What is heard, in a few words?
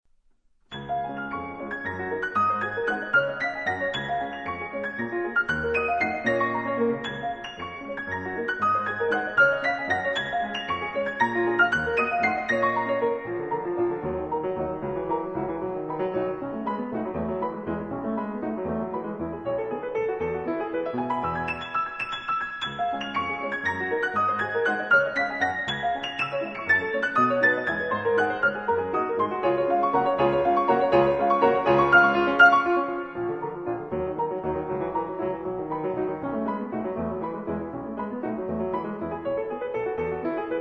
waltz